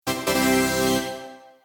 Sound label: bonus unlock; Disney Infinity 2.0 Marvel Super Heroes